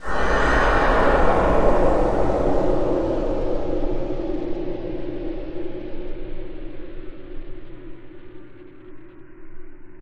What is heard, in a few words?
ominous, ambient; q3rally